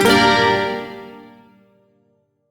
Shawzin Min Chord; Music; Warframe; Duviri; Chords

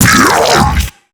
Hand Bomber Pain; Warframe; MiTW; Battle Barks

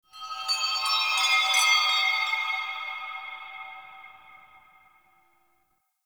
Chimes; Warframe; The New War; Narmer Outpost

